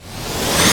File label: sfx sci fi; Masterbits - Switch FX